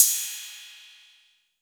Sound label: Roland 808 CY